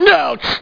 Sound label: hit; BattleSNDS